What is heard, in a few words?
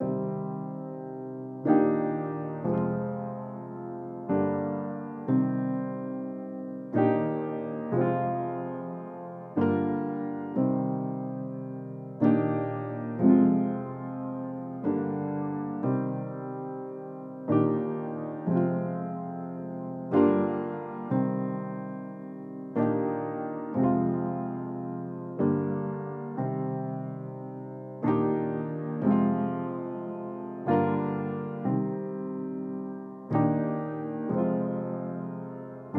182 BPM G Min Piano Chords; Loop Stems; Cymatics; Dom Perignon